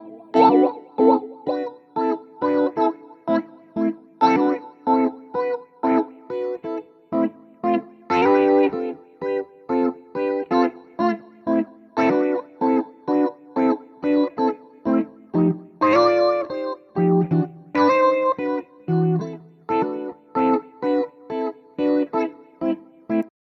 jazz guitar; envelophase

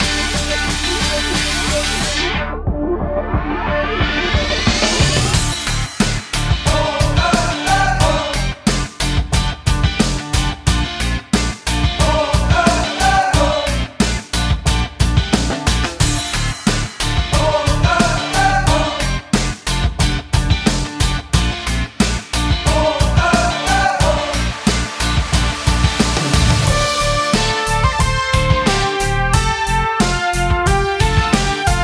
guitarist sparkle; virtual guitarist

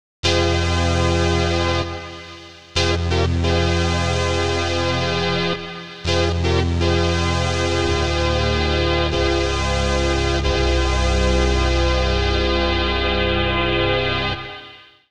pad seq; synthation

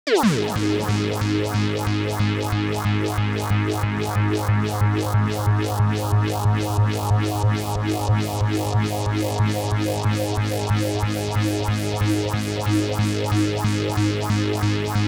rhythmic

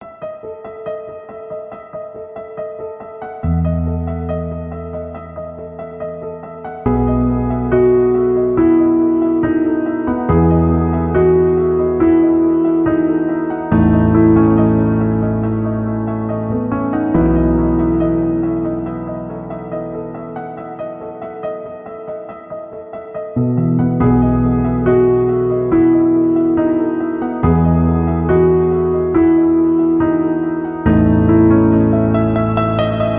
royal piano

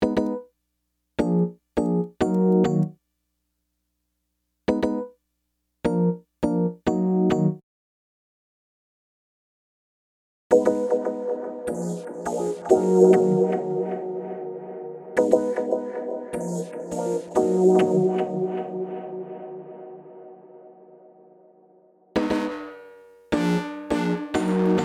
keys

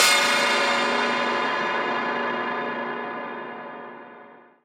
gong

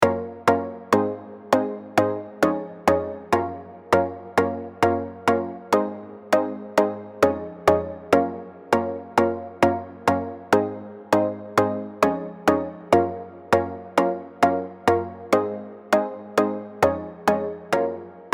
synth warmer plucks

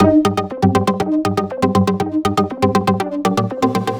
multi delayed

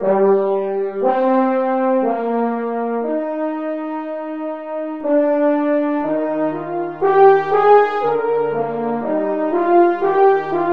french horns section

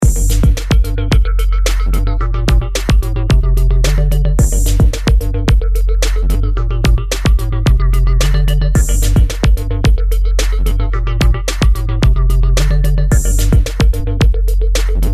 multilfo synth and bass

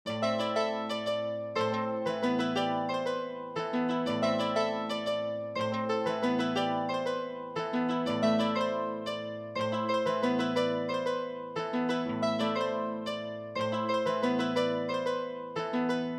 mandolele

